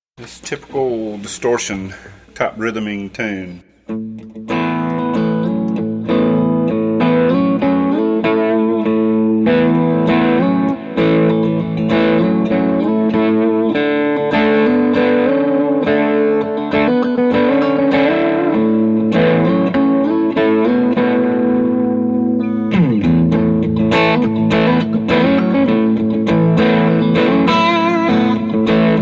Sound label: mild distortion with chords